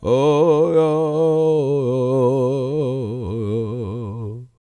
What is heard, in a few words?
World Voices, CHOCTAW